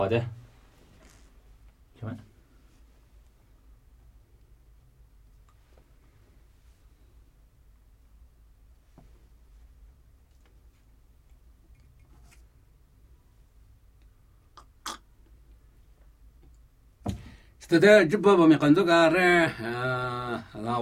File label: Namuyi Tibetan Ritual Chanting; Mbo kho bu su